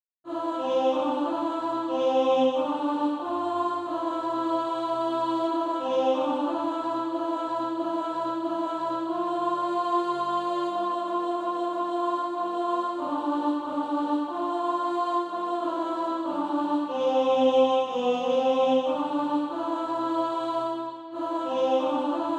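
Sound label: alt, Practise Music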